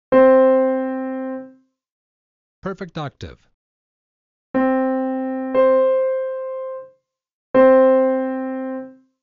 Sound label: Perfect Octave Example